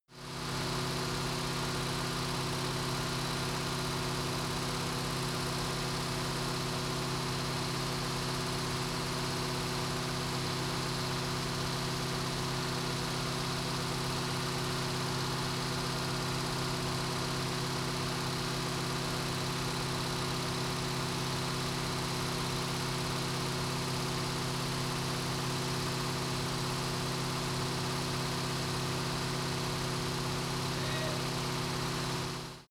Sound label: car camaro high rpm engine